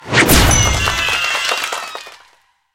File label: bubble rankup switch